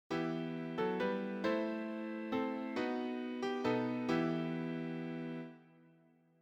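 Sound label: cadence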